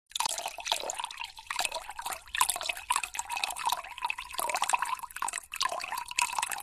Pouring water

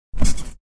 Cartoon jump bounce spring; boing

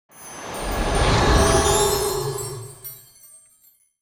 Christmas whoosh transition

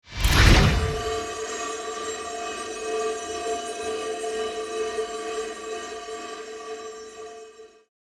Holy magic spell healing circle

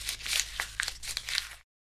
Cows eating grass

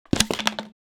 Impact of plastic bottle in trash can

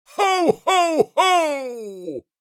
Santa claus jolly laugh; christmas

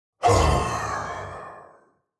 Demon king lord breathing

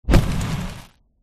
Fireball cast hit

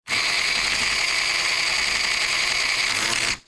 Remote controlled sport car road movement; rc toys

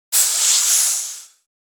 Snake aggressive hiss

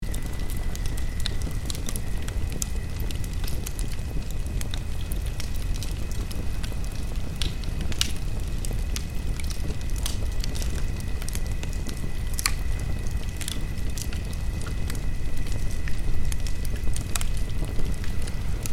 Relaxing campfire night cozy ambience loop

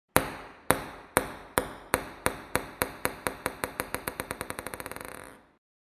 Ping pong ball drop bouncing until still

